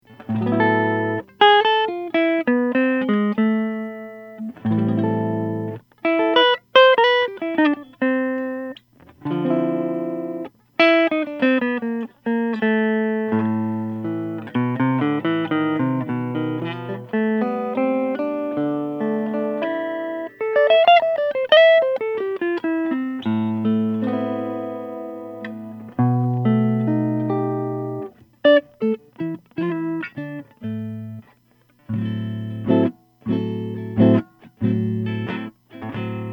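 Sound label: Guild Benedetto archtop guitar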